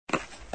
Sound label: Climb; Bob; bobtherobber2